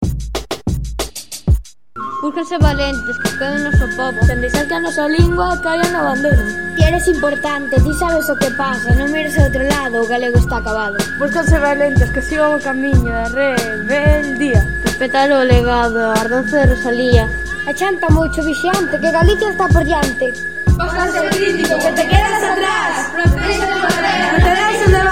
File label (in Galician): Rap